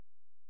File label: soundtrack; wastefacility